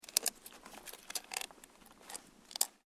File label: SNOW CREAK